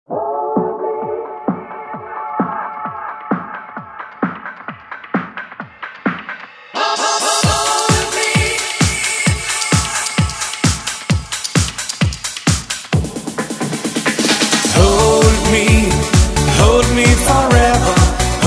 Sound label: hitmedley